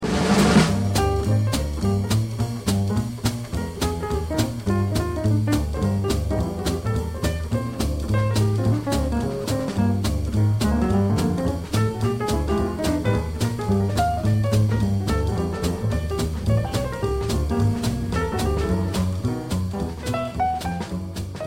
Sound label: piano solo